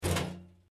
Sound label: Pipe Tin Move